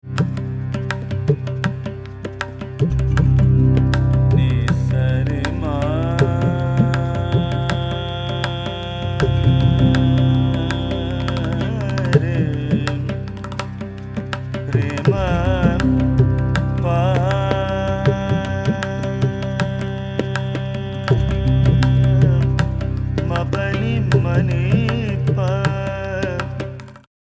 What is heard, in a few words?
swirl